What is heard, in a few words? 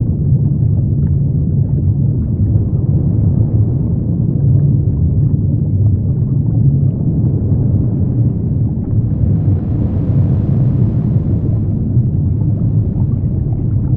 Black Smoker, Water; Barotrauma